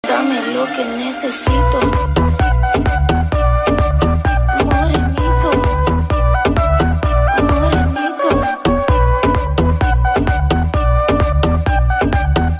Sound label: arabic remix